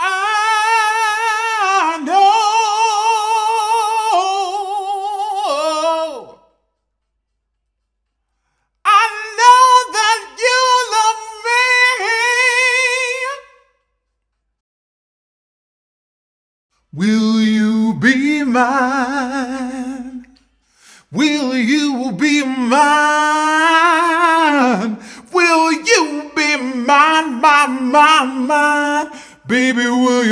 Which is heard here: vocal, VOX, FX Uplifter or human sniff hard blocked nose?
vocal